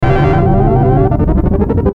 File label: Stinger Ringer Ascend Chopper; Production Element Imaging Element Accent Transition